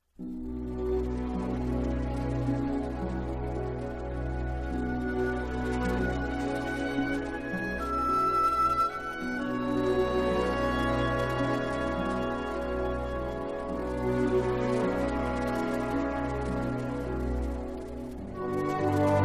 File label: Instrumental